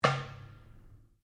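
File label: Drum Darabuka Slap piano, Egyptian Darabuka Drum; Commanly available Egyptian Darabuka Drum; Drums; Sound Effects:Musical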